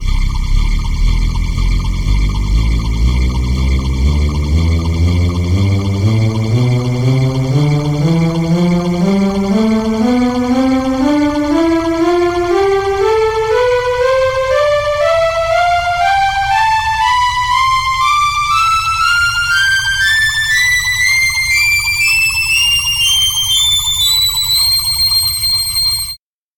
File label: soundtrack tonleiter